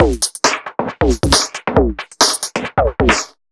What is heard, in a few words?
Beat Ring 136bpm, uk garage